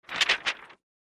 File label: paper, Mechanic